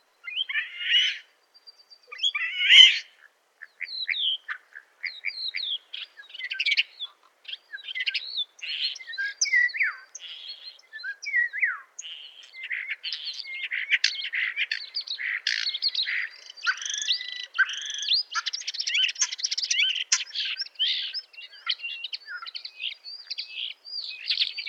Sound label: Sturnus vulgaris